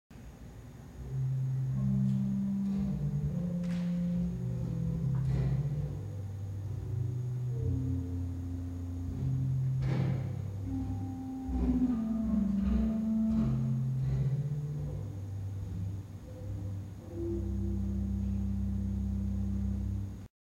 Subbass 8, organ

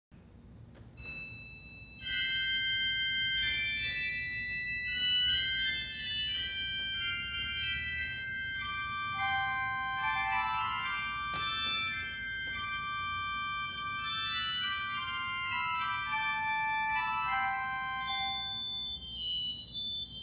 Cimble mix, organ

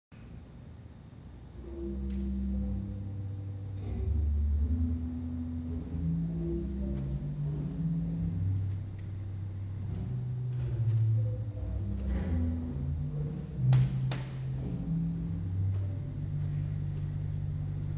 Subbass 16', organ

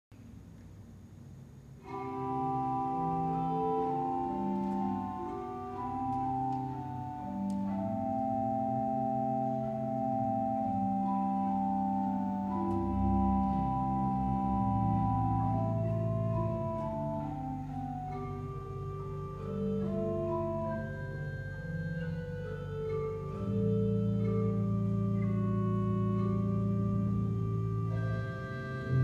8 Prin 8 Holz 16, organ

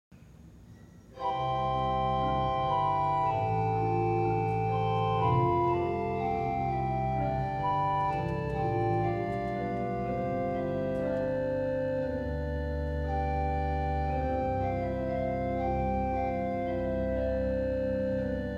Ensemble, organ

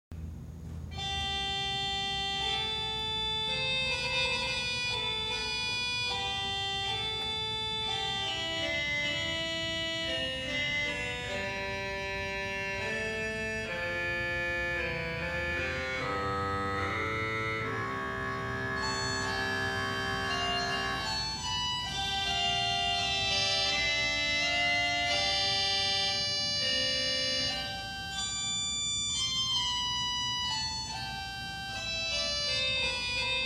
Regal 8', organ

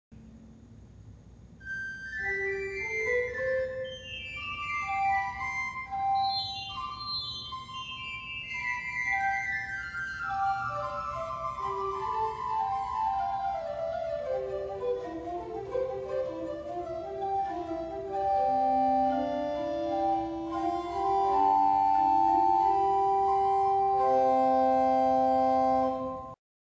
Sifflet 1', organ

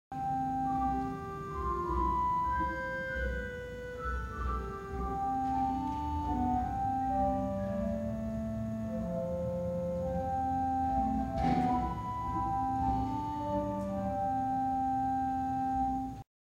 Pommer 4', organ

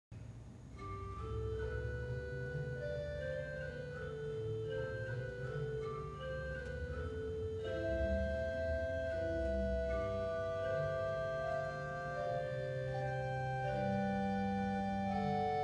Gemshorn 2', organ

